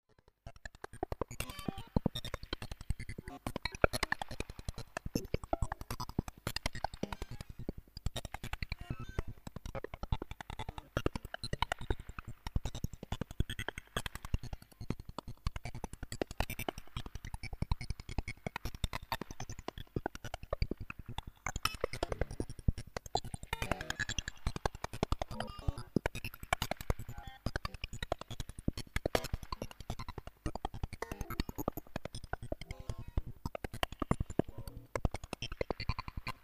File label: Dropping Beats, Rs370 in analogue poly mode